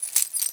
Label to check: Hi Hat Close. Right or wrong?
Wrong